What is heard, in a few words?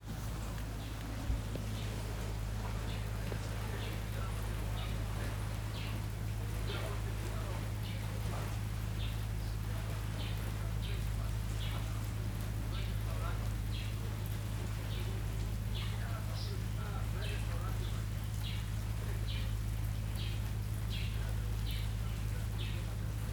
Mussulo Rain Birds